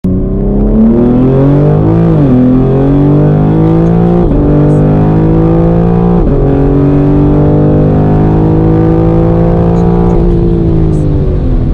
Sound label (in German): Sound Innen M4G83 800PS